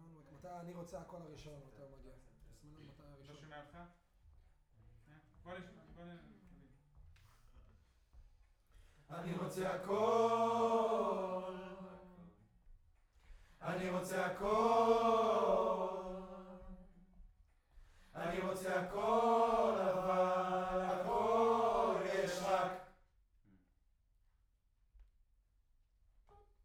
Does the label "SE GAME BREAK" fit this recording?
No